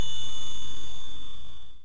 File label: tinkle